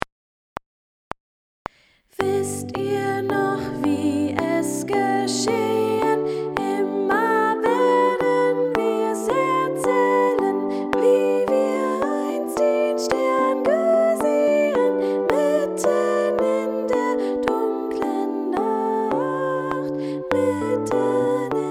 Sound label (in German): Sopran